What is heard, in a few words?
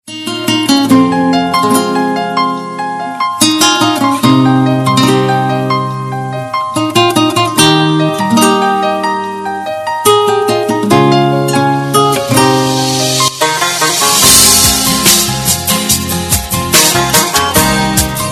Guitar Irani